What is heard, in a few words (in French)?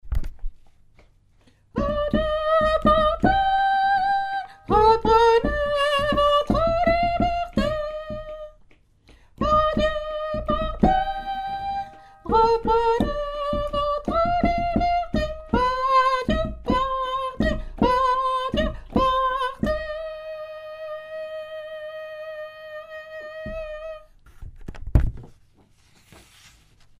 saltimbanques soprano